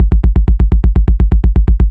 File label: loop m1919 bass; guns tanks